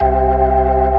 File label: B3 C 3-3, ORGAN